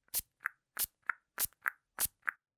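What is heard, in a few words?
psst sound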